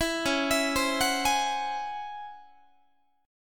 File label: Db-Minor Major 11th Guitar, DbmM11 chord; arpeggiated; JGuitar